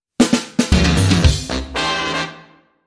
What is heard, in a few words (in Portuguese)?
Banda Ao Vivo